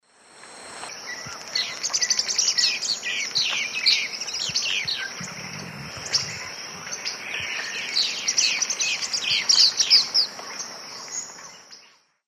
Conopias trivirgatus, calls; S. America; Eastern Lowlands

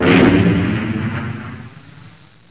CANNON

